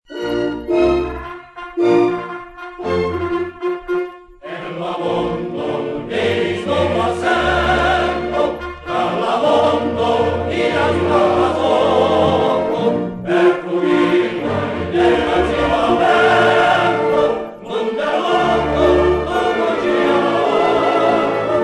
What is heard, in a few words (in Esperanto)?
himno